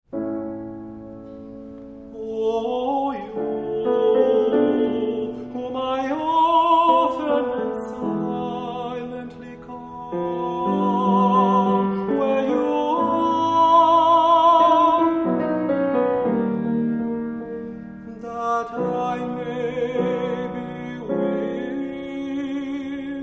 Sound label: Songs for Baritone